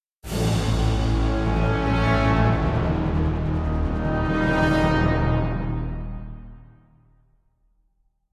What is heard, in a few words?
Combat End; Mass Effect 2; Derelict Reaper